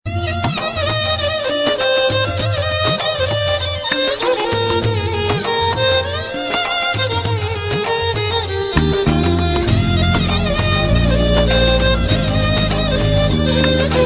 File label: instrument ringtone